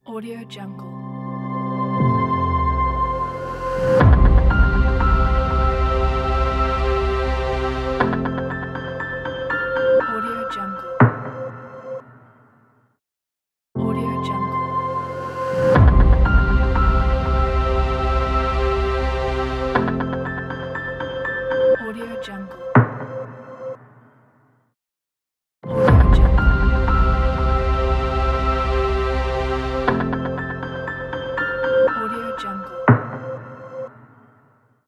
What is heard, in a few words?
documentary logo